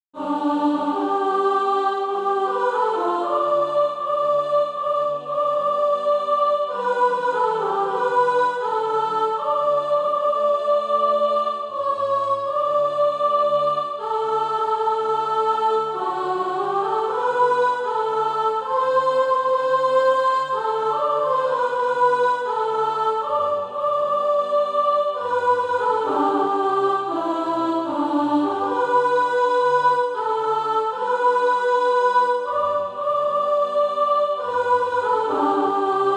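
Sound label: soprano, SATB Practise Music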